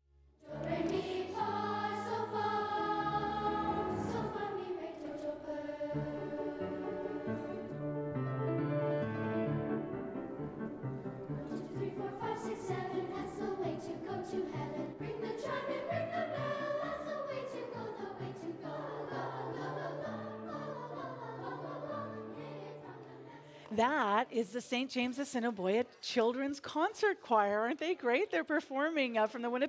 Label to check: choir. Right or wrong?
Right